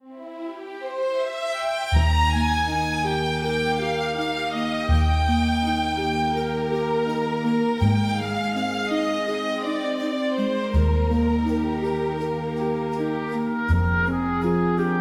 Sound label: christmas by the fireplace, winter tale